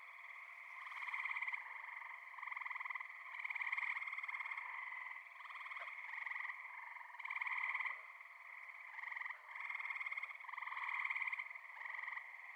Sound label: Gray Treefrog